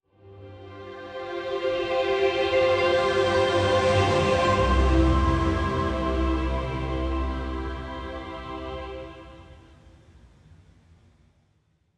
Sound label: botanical swell Cmaj 108bpm; bespoke - Floral v.1